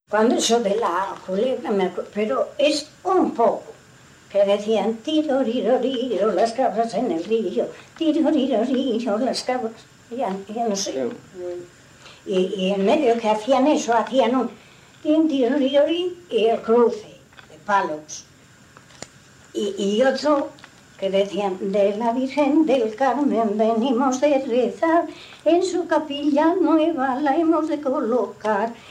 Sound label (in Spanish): La culebra y algunos paloteos, Bailes; Villacarralón; Valladolid